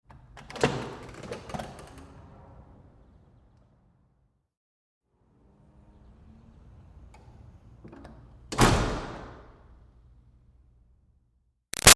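Door Metal Commercial Push Bar Interior Light Open Close; Doors; Big Room Sound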